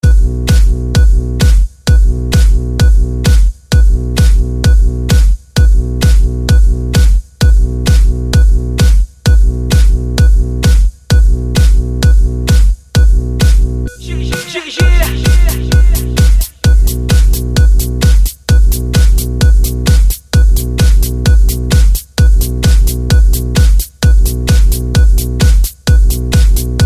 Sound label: Dance Sound